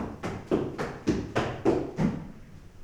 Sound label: FOOTSTEPS, Science Fiction; Mower Man